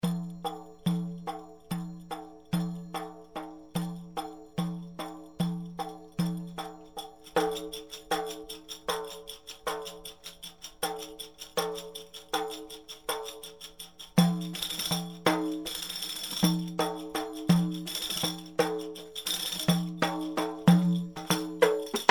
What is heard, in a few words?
Solo defi; tsiganoi